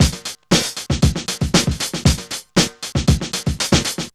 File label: SLAMMIN 117, Funky Elements loops; Zero-G